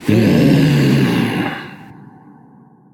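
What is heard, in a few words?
non verbal agree, voice; cernunnos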